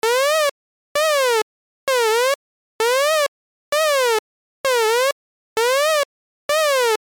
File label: LFO key off